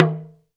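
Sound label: Talking Drums; Roland - LA Composer vol. 1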